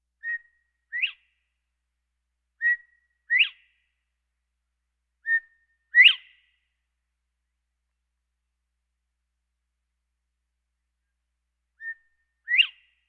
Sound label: Birds